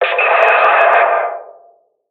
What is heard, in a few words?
ambient battle; World Of Warships